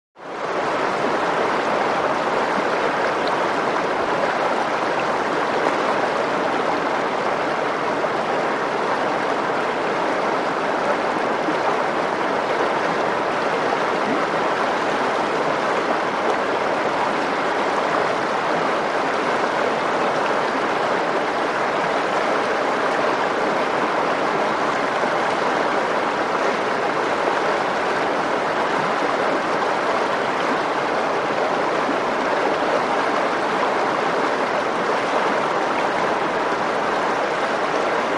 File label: River, Medium 1; Medium-fast Running With Roar.